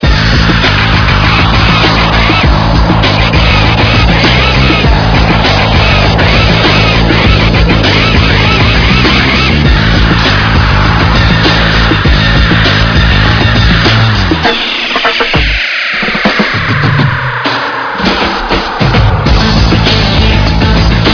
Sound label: thunder